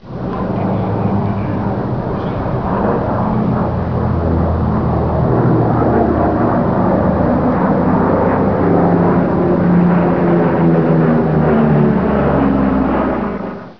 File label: P38 lightning